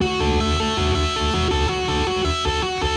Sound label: 162 Indierock Guitar Am; Punk & Indie; Rock Hard